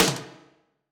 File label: Snare; 孤独摇滚